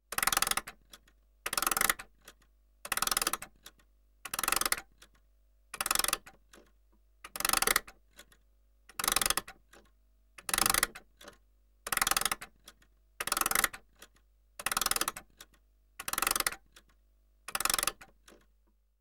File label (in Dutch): Opwinden Klok; KMP Film Video Sound Effects